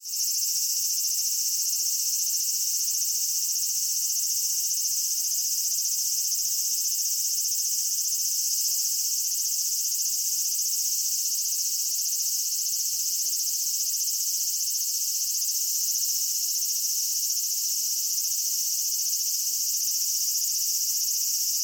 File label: aburazemi cry